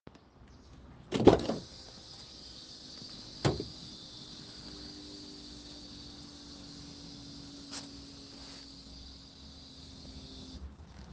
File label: sound when unlocking car